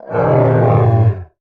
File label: Dinosaur Snarl; visitdenmark; SFX Producer